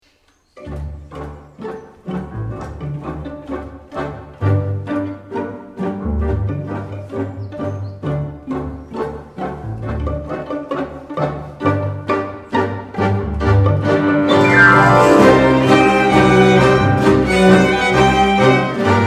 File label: Tango